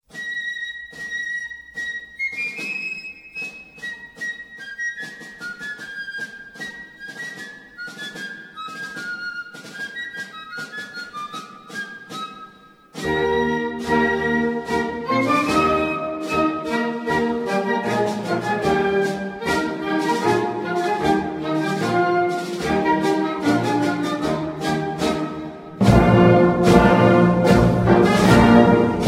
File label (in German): Berner Marsch; swiss